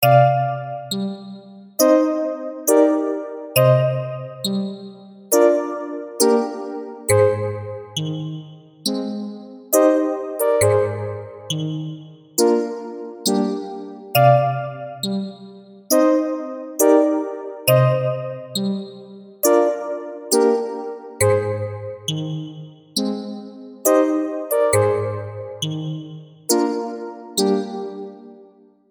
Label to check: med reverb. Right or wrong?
Right